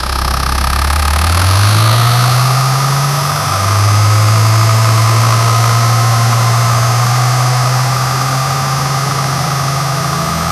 dacia 1310 acceleration, automobile

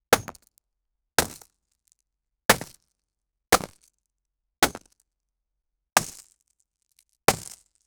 pickaxe hitting raw stone

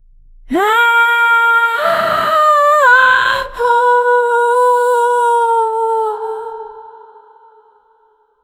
sound effect low pitched, human vocalizations

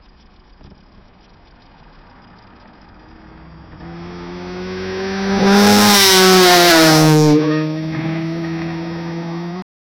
a sound of a mercedes, automobile

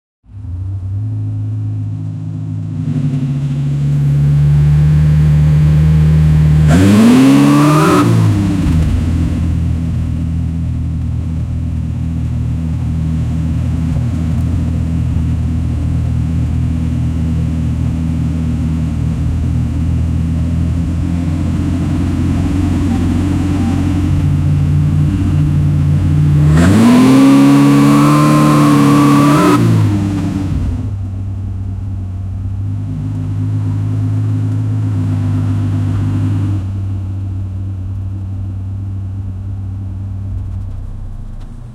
street sedan car ignition; automobile

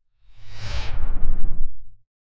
whoosh sound effect for animation

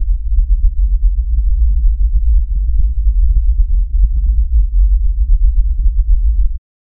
deep low frequency distor, bass pulse

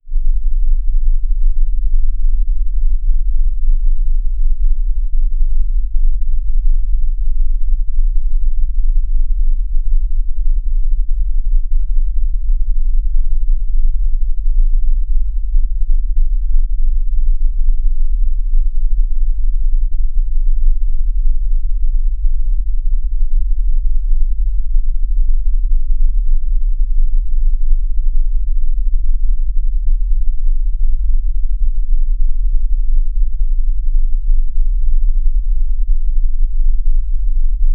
lonely pulse ambient bed

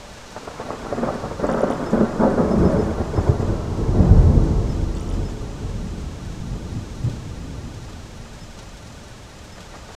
dark clouds swirl thunder

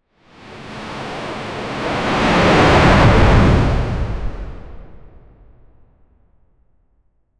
a sudden deep whoosh of, ocean

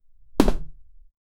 jump character for plataf; character actions

